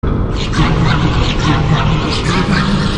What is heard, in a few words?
ufo toilet